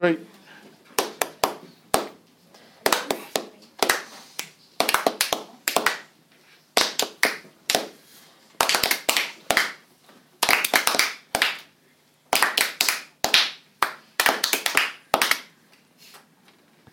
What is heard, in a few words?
rhythm grid